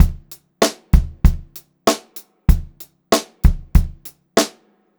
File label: BEAT, Pop-Rock Drumloops; USB Soundscan vol.37; AKAI; 96 POP